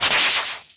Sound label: Blown tire